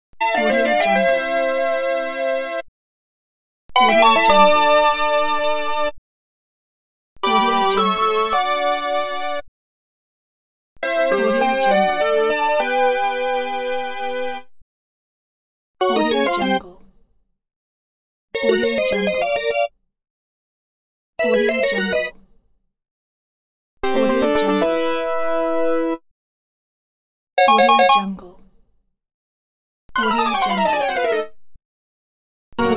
game sound pack; jw production

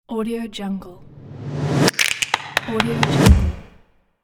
claps logo

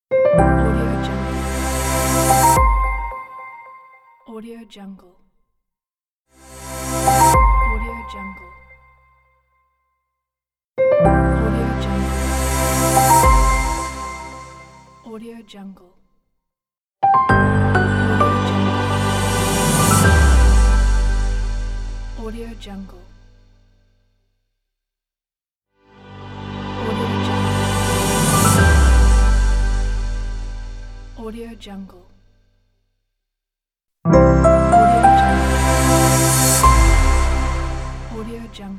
short logo pack

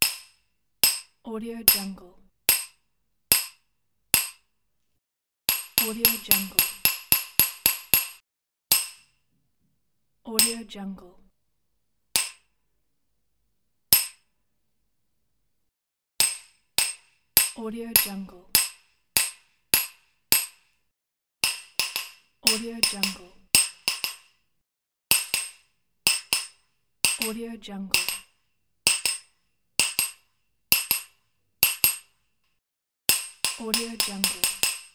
hammering loop pack; debsound

